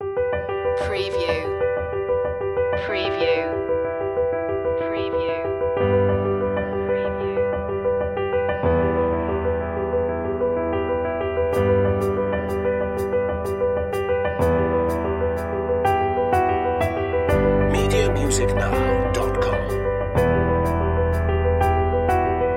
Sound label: piano